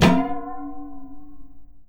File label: KETTLE, household; AKAI Anime Sound FX